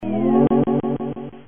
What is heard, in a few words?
correct; beam